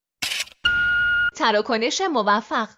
Successful transaction, دستگاه کارت

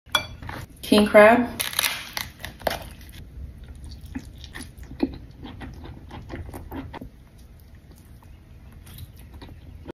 eating crab

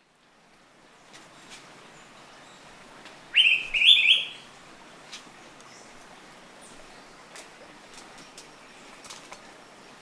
araripe manakin

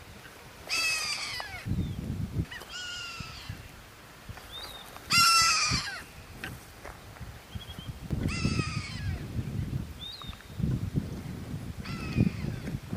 Black caracara

